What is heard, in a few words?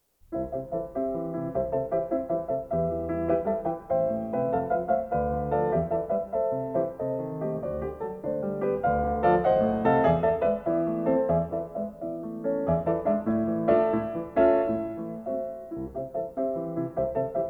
Piano Pieces, Intermezzo in C; Brahms 4 Piano Pieces, Op.119; Curzon